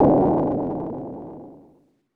Boom!; rock n rage; arcade; Staff Roll SFX

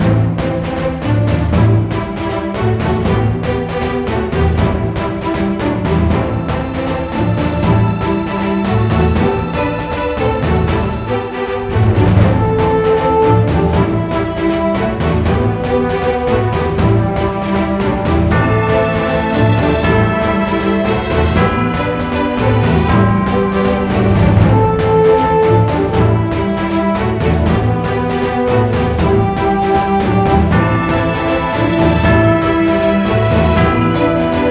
BGM Battle; Cookie Run Kingdom

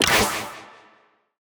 Loop Fire; portal quest; android; Rebel Skill1

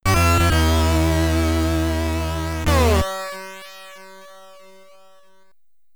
Get Ready, SID Stereo; Spooked; Commodore 64

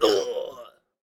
punkcroc pain

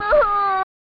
Groan; Sailorvenus

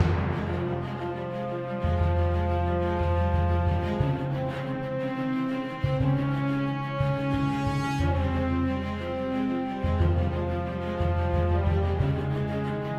Enemy Fight Stem; Rocky Valley Enemy Fight 2 Stem 1

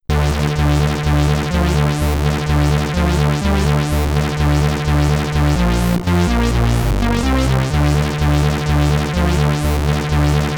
Iron-Man Race, SID Stereo

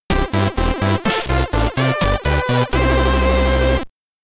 Stage Clear!; Eskimo Games; commodore 64

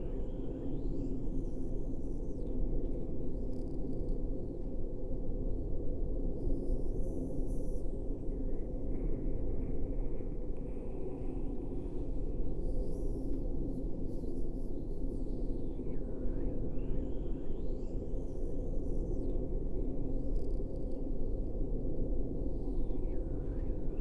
amb cave

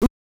Low Toned Chuckle; Mike Tyson's Punch-Out!!

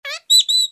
Bird Cage Idle; angry birds rio

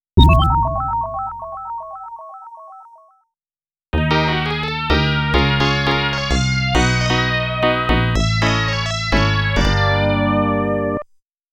Miss ~ Game Over; fantasy zone

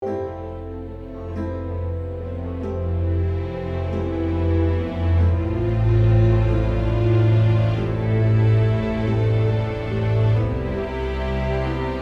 Hopeful (Loop)

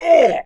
pain; bruenor

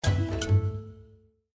Logoff Sound; Savanna

